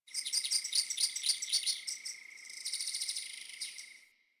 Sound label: Camp Night Insect Generic; Ambience; One Offs; Insects; Warframe; Quests; The New War; Drifter Camp